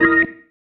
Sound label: Stab One Shots, Organ